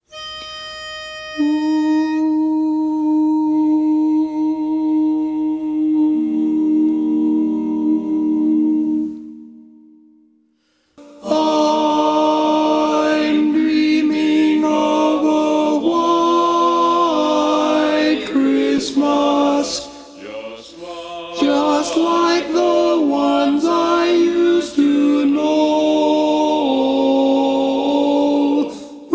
shorter tenor; mono

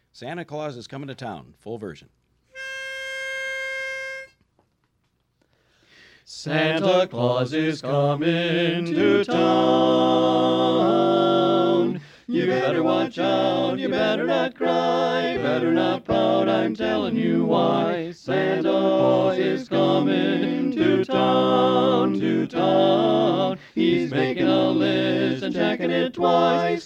lead; Christmas

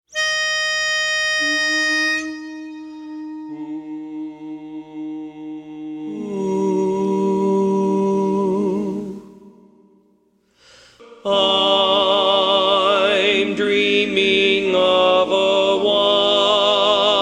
lead mono; Christmas

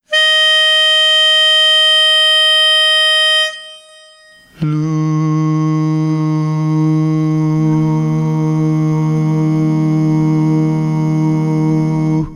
O Canada Bass; mono; Anthems

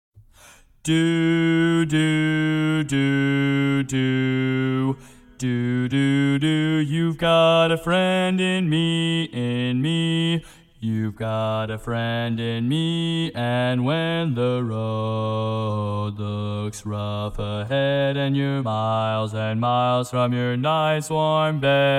bass SOLO

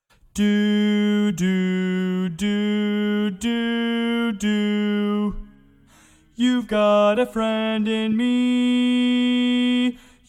baritone SOLO

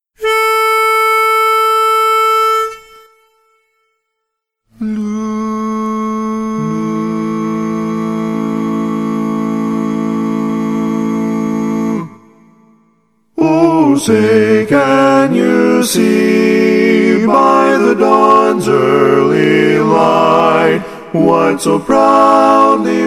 Star Spangled Banner Bass missing

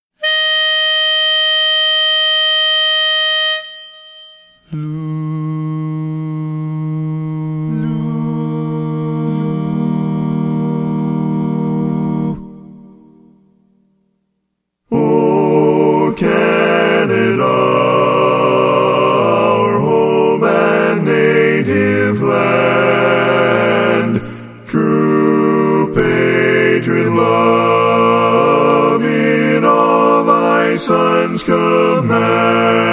O Canada-Tenor missing

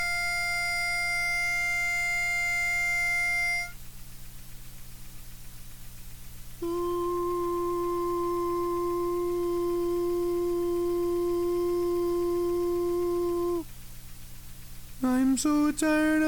tenor SOLO

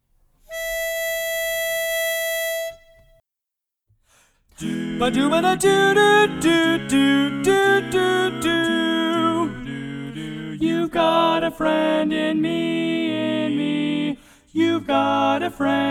Tenor mono in E

